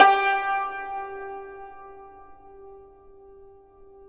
YANGCHIN, G3; INIS - Opium

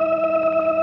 ORCHESTRON E3; Sonic Reality; Vintage Timetraveler

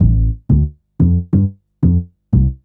Upright Synth Bass, Drum & Bass; Fluid Dynamics

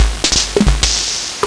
Nintendo Gameboy LSDJ

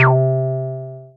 Synths BASS

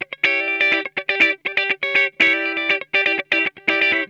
E PEDAL B, Funk Guitar; 90 s Sample CDs; Zero G

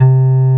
OB8 blow C2, OBERHEIM OB8; Big Fish Audio; First Call Vintage Keyboards

